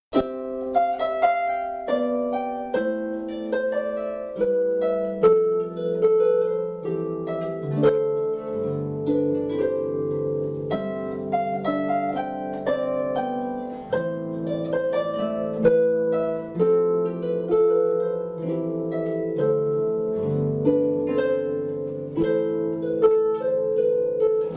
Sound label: Hornpipe, Harp